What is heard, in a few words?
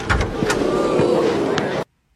ooo; disney